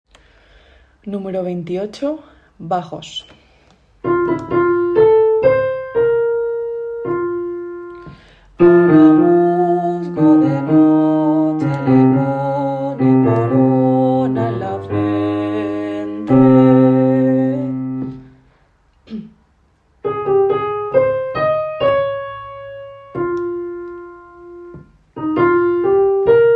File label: baj; Bodas de Sangre